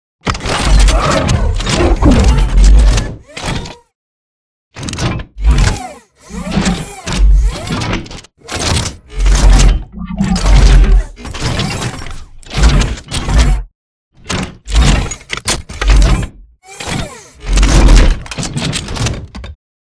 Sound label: body grabbed intro; Titanfall 2